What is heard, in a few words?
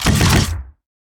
tower tricannon basic Attack